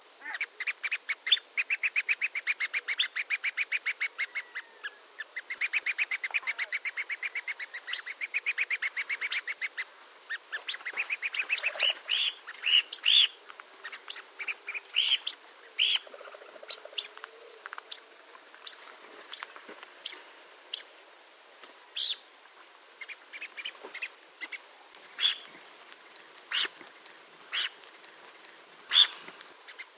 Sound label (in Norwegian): POLARSVØMMESNIPE PAR